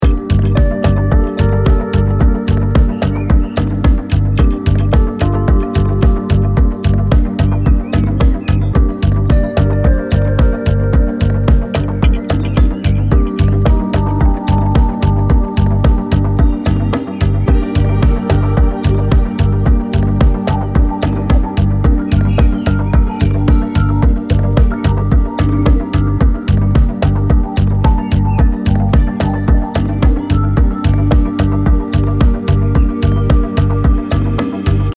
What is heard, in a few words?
Forest Spirit (110BPM)